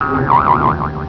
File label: BLINK, CARTOON